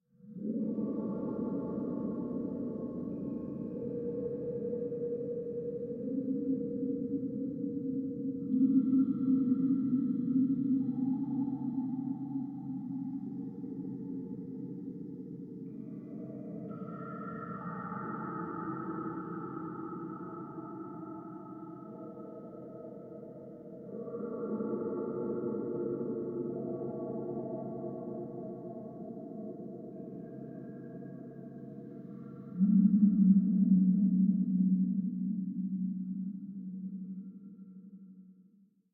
Cave Moaning Deep Ambience - Light Distant Drops And Babbles, Cave Tones Air Howls; Hollywood Edge; sound designer's toolkit v2